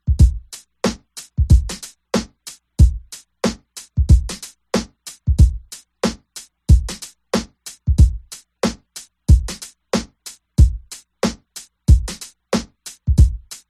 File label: drums pattern